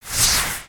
launch destination ROLL, ui; aronwk collection; ndaudio

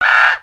Disapprove, creatures pets

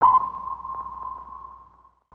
Submarine Spawn; destructibles

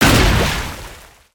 Oil Spray; weapons; aronwk collection; ndaudio

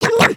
eat

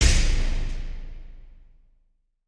piston arrive

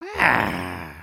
Stunned, creatures organic

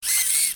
Overbuild Fidget

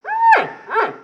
Pets Bear Panda Beg; creatures; Lego

